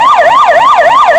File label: yelp, sirens; danish